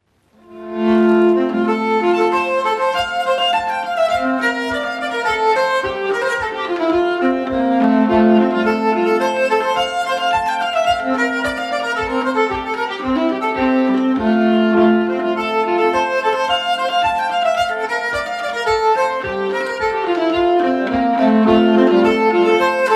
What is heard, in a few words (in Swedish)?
Nyckelharpan